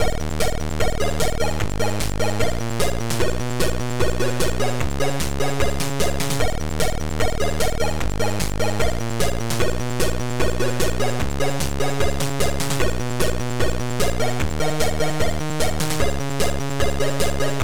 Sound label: Chords